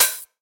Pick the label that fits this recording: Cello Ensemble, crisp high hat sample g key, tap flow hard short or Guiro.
crisp high hat sample g key